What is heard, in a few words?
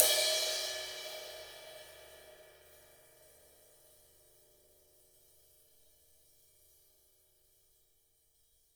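JAZZ KIT ride, Drums Acoustic; USB Soundscan vol.10; AKAI; JAZZKIT 2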